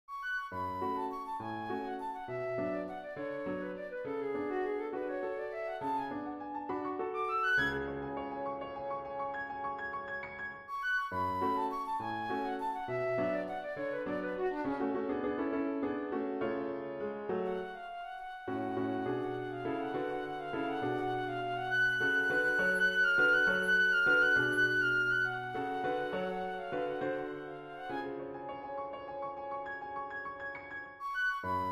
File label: impromptu duet